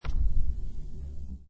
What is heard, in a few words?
Billiard Pool Snooker Close Up Sound Of Ball Rollling On Felt